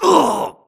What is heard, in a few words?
grunt death quick; Titanfall 2